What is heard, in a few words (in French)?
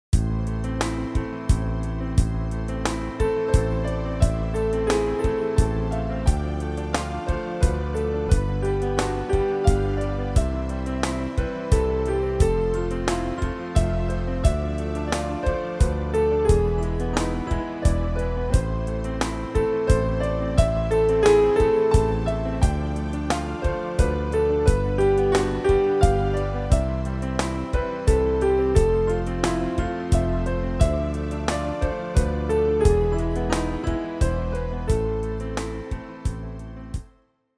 BALLADE 3 TEMPS